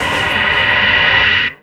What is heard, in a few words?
Breath; Solution